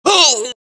death, dialogue, Allies; mohaa; Mission 3

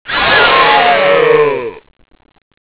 houndeye die; xash3d fwgs

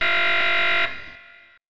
buzzer; singe

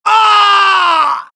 death, dialogue, Allies; mohaa; Mission 1

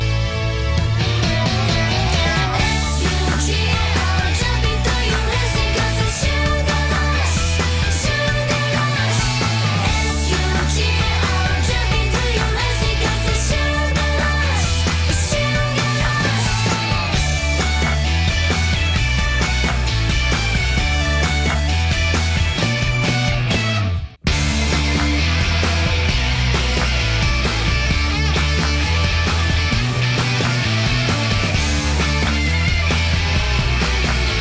music; singe; Sugar Rush

